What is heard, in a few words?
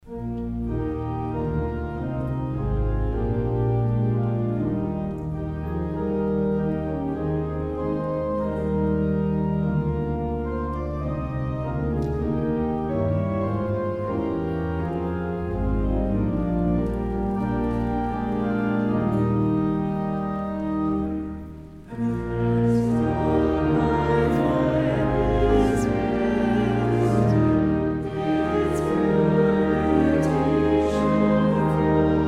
hymn